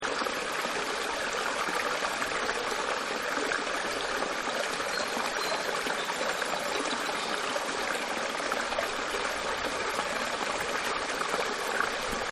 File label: Gutter water Bird; Nature Scenes; Environmental; Sound Effects:Nature; Partners In Rhyme